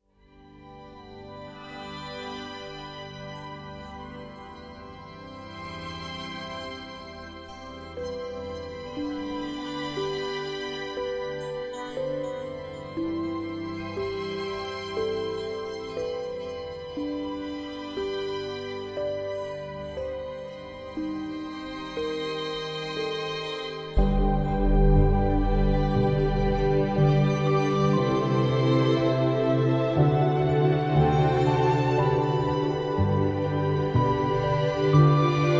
Clean Ambient

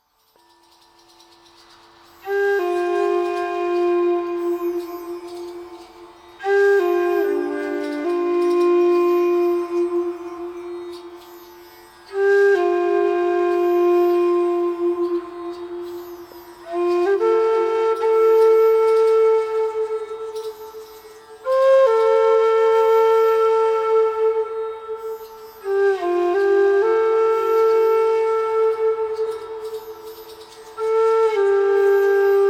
Nature Sounds with Native American Flute

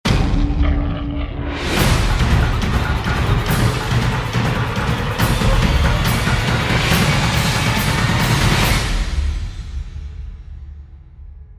Tribal Threat Riser, Percussion; Gothic Storm Music

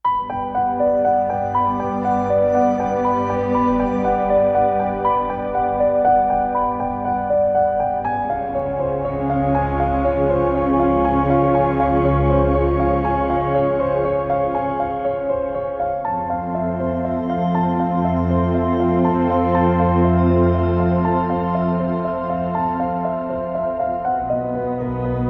Ambient

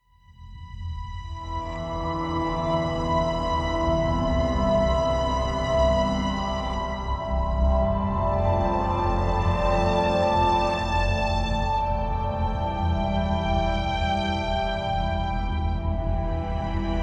Doubt Ambient

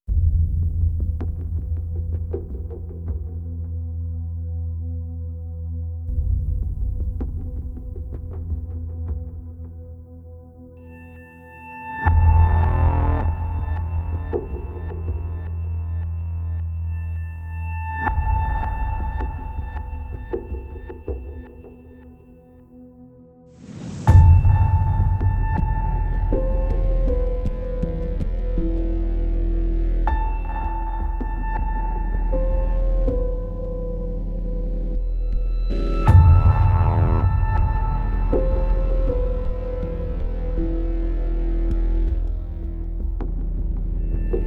Echoes; Ninja Tracks

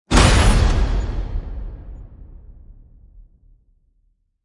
Brash Hit Metal; Ninja Tracks

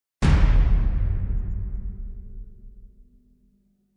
Taiko Softer; Gothic Storm Music

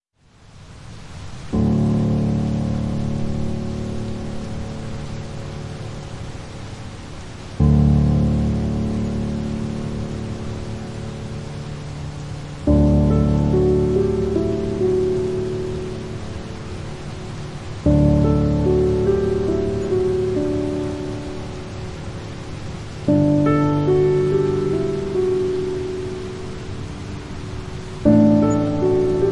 Piano, Piano & Rain

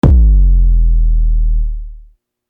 Hammer Drop Hit Downer; Ninja Tracks

